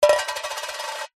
money coin single set down on base of tin hollow spin; foley